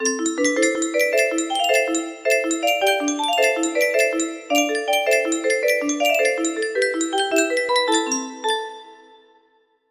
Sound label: fast, music box melody